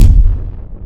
Impact, FX

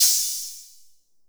OPEN HAT

